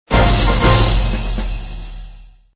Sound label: win